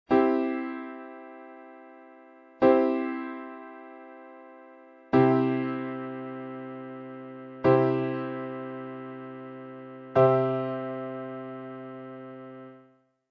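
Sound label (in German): Dreiklänge in vierstimmiger Darstellung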